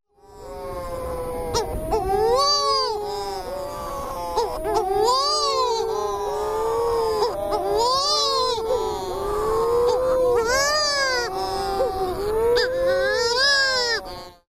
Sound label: kauai wedgie